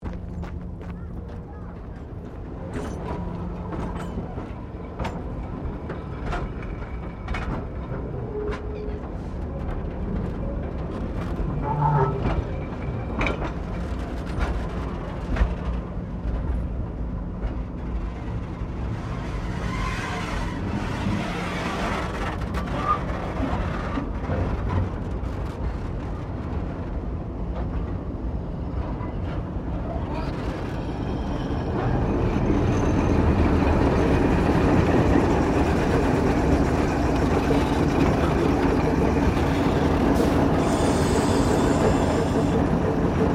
Train Diesel Shunter V8 Class 1955 Tasmanian Shunting; Bellarine Tourist railway vehicles; Trains